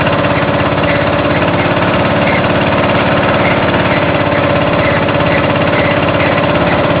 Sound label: Pumper